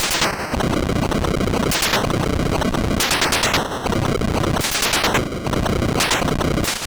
collapse, attack sound; カタナ１

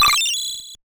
Other attacks, 8bit; カタナ１

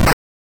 hit, attack sound; カタナ１

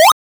Throwing, 8bit; カタナ１

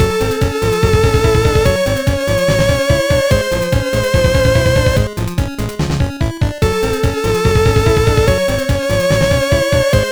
Harsh underwater battle; 牙と鋏

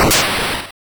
missile, 8bit; カタナ１

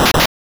cut, attack sound; カタナ１

